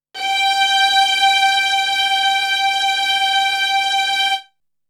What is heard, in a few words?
Strings; ZERO G - Datafile DISC 3